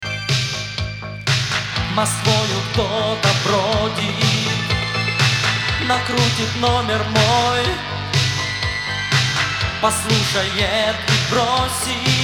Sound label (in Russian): Rington na zvonok